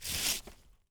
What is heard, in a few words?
plant cut; gmod